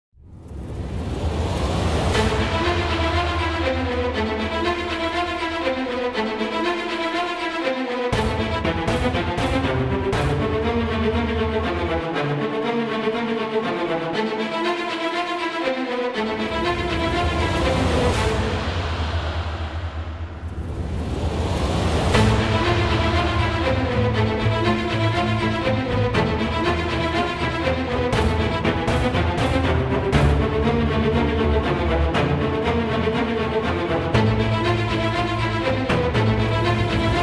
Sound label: Epic Strings; Epic Orchestral; StudioLucid